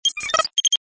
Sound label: computer